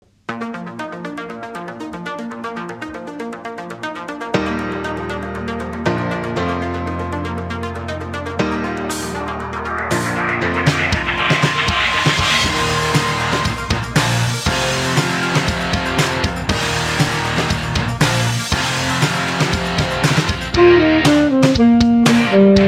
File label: sax